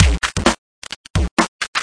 130 bpm bitcrushed electronic beat

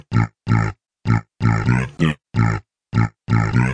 vox glide loop; Ze Franks Pain Pack

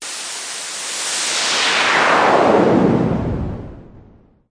noise Modular

